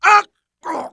hit, pain; mohaa

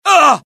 death, pain; mohaa; dialogue

